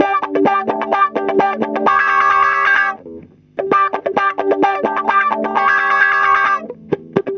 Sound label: HOT GTR, Roots Disco; USB Soundscan vol.32; AKAI